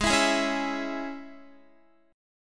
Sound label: G sharp Suspended 4th Sharp 5th Guitar chord, down; strummed; 4E,4A,5D,5G,5C,6F; G#sus4#5